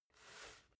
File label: basketball turn swipe short